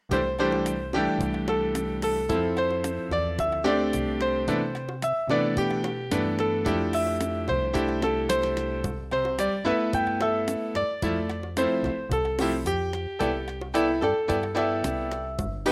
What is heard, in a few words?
Easy Bossa